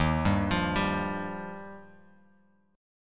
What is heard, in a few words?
Eb Major Add 9th, Bass Standard; Ebadd9 arpeggiated; 11,10,15,12; JGuitar